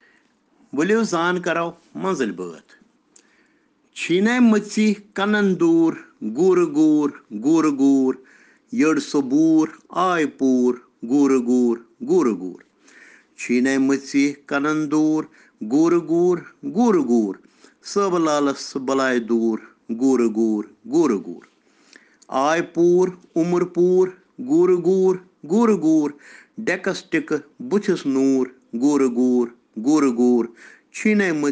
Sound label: Children Rhyms in Kashmiri